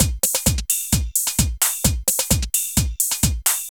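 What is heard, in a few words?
drum loops, left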